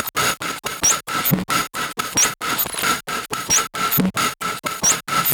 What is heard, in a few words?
Top Loop Glitchy 80 BPM; M8; percussion and foley